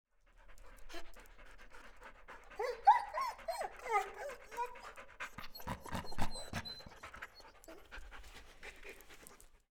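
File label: whining, grumbling, growl, dog growling; skulezh vorchanie ryk; rychaniya sobak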